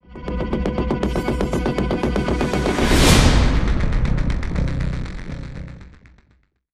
SYNTH SWELL HIT; gamerip

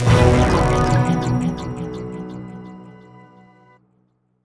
Mission Sting Fail; fat city

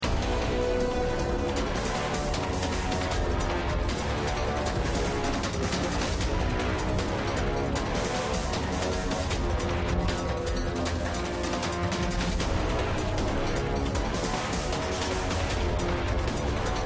Gameplay Loop Action; Ntek; Max Steel Rise of Elementor; android

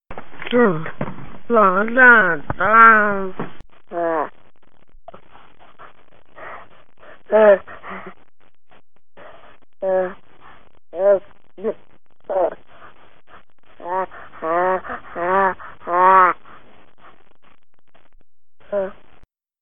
Mumbling Baby Sound Effect end

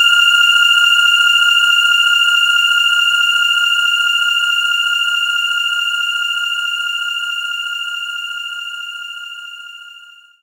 Voice Loop F4; knights try

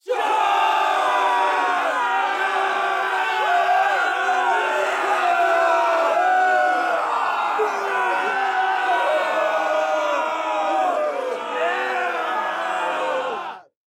crowd battle cry charge scream; a dump in the dark

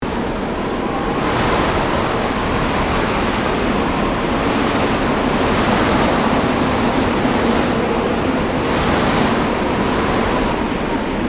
Wind Desert; AMB; Duke Nukem Forever

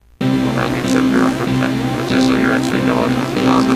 Warning; satazius windows gamerip 2011

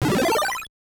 Slipice Hit; Mario Bros.; NES

